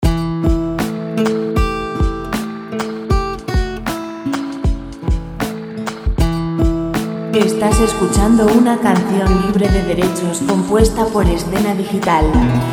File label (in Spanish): 78 lent; relajante